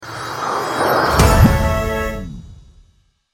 Success; Cookie Run; Evolution Treasure

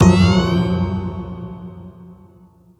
Musician Bass Player Hit

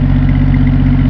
cars running; Dad 'n Me

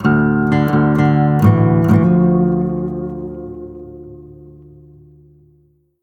complete sting

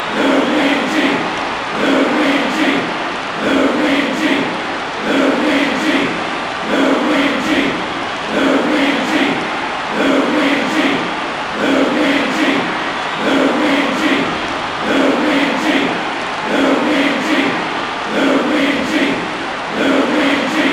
Crowd Song; Luigi's Crowd Song; mario strikers charged gamerip

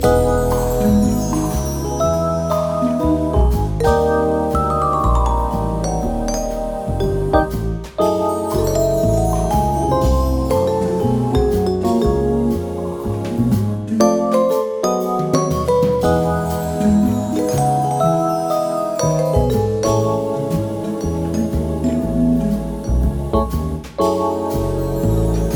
Subscription And Lucky Spin Music; rocket buddy

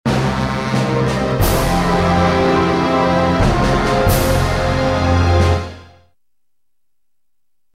Mission Failed; Dangerous Pursuit

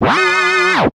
Losing A Game Jingle; Spookware

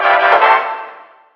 Card Normal Stinger

